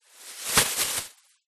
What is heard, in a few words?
foley plastic bag very thin item drop in; zapsplat